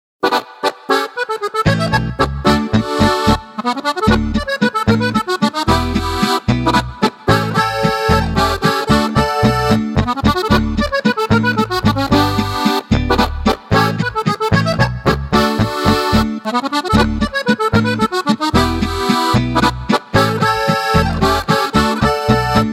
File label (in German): Akkordeon